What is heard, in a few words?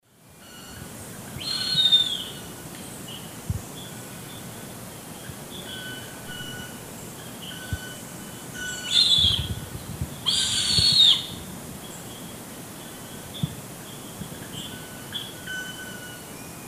Accipiter bicolor, calls 2 birds; S. America; Eastern Lowlands